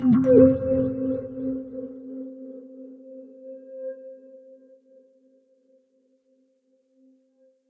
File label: Lost Keys; Magic Potion; Ableton; Factory Packs; Lost and Found